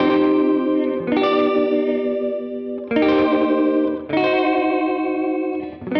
Gretsch Guit 80 C, Loops n Lines, 80bpm

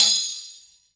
Tambour; EMU Orbit-3

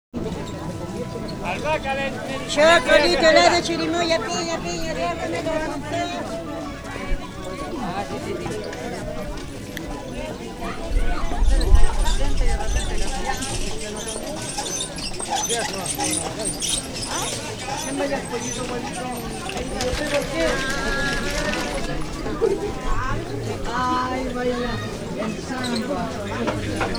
Salsa Picante; Masterbits; World Zone VOL-3

